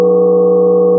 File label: Harmotome, chords